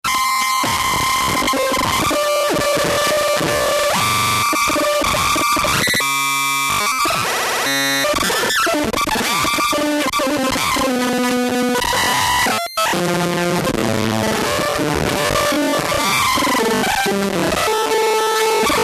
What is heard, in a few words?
noize